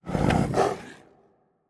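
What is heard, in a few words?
Huntress Run Tiger voice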